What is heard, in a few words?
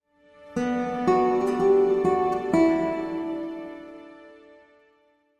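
Music Logo Classical Guitar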